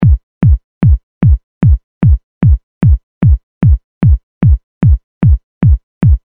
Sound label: Muted Kick; Industrial; Kicks